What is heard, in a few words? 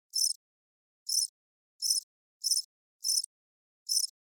cricket chirp; locust